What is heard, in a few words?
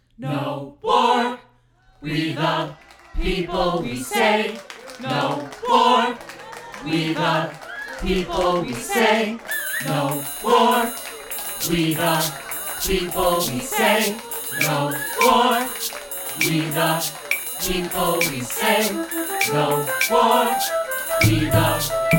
Beep Beep; Star Pieces soundtrack